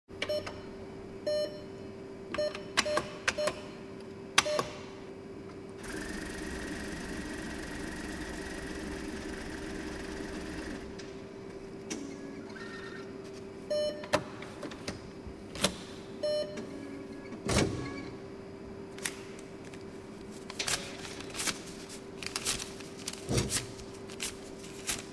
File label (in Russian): Звук ввода пин кода в банкомате